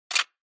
Camera Film

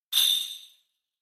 Kagura Suzu

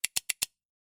Scissors

